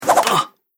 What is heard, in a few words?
Kung Fu Hands Blur Opponent Knocked Out; Punch And Hit Sound Effects; Andrew Fleming